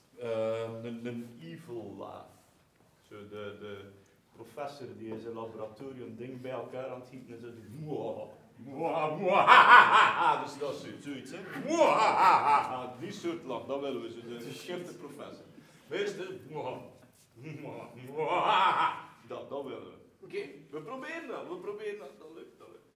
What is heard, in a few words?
Evil Laugh